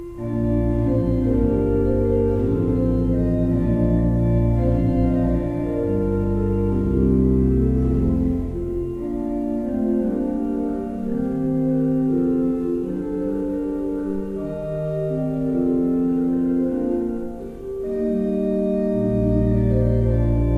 Breil, gebrauchtorgel

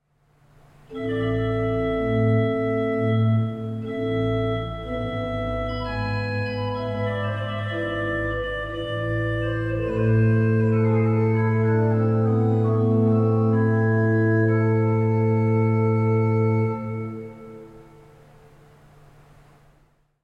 Orgel, pfeifenorgeln

